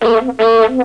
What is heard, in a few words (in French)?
Tutut